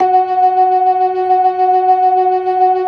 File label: LO MAN V L, ORGAN LOWER MANUAL; Keith Emerson Signature Series